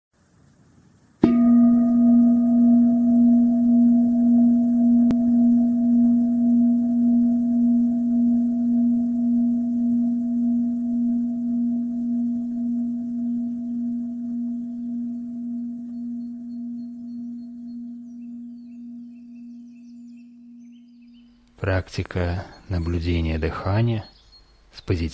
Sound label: breathing bird song; Практика наблюдения дыхания, с позитивной настройкой. Пение птиц. 32 мин.